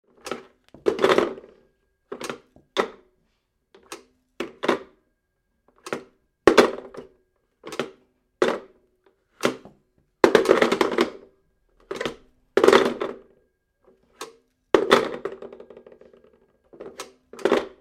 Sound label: Telephone Receiver Up and Down Bakelite Phone 706 Model; Various - 1940 to 1960s